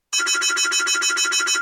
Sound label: alarm